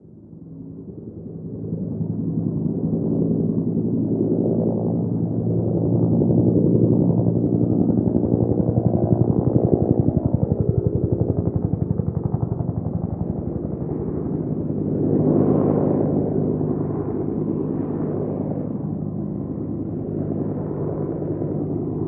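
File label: heli flyover interior; ModernWarfare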